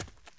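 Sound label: run right, dirt FOOT; The Elder Scrolls III - Morrowind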